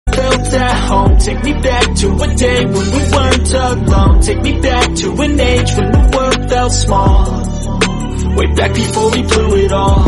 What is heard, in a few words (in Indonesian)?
Nada Dering Lagu Barat Pendek Dj song